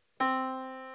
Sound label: Yamaha Disk, piano sound